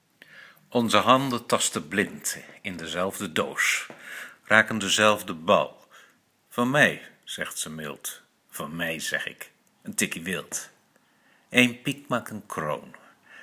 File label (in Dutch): hartritme; nl